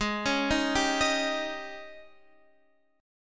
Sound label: Ab Major 7th Suspended 4th Ab Guitar Half Step Down